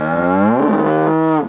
Cow Moo; Weird

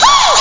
ALERT; DarkSound

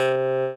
noise

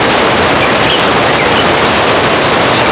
country day; PC Gamer IT CD 41; Daikatana

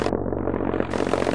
Plane

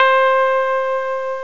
pedal steel

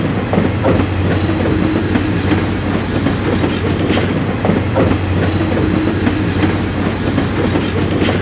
Train in Motion

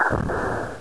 Eject; Flying Nightmares Demo

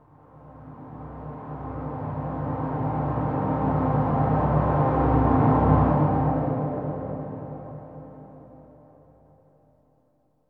SYNTH PAD AMBIENT 160 C, Ambient; eJay; Sphere

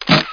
part attach

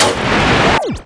tuushin shot; VirtuaTennis

